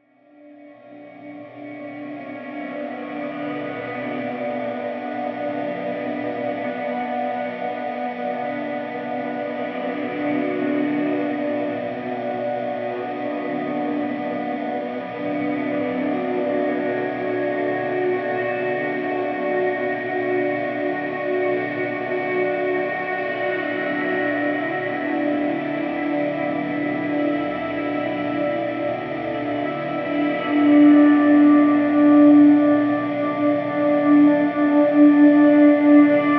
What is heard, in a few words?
bleached soundscapes